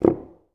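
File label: staccato C0, Orchestra; Best Service; Peter Siedlaczek's Advanced Orchestra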